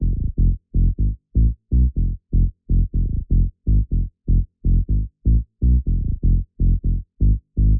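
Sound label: 123BPM DRIVE BASS F minor, Bass Loops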